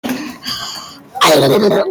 demonic goofy burp

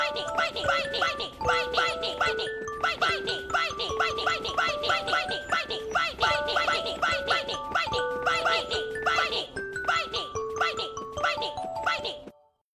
alarm

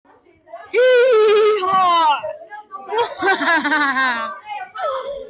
hehehehar

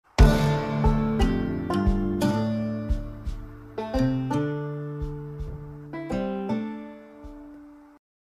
level up; project zomboid

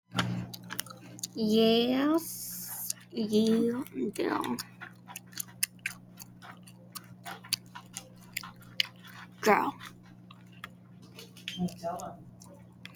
gum smacker

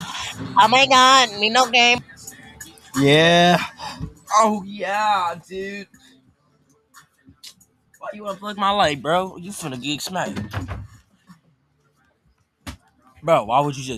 hrhghrhgrh

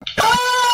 ahhhhhhhhhhhhhhhhhhhhhhhhhhh

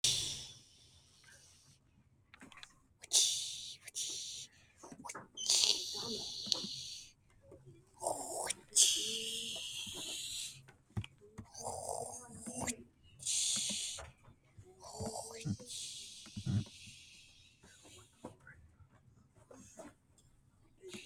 whipping

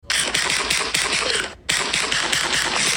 eating snacks; minecraft

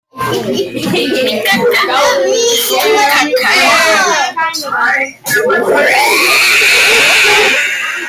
rahhhhhhhhhhhhhhhhhh monkey

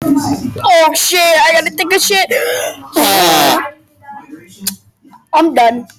wet fart; meme

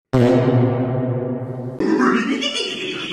fart laugh; scooby

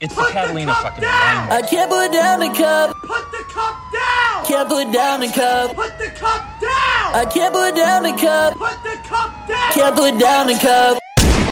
cool fart big check sad

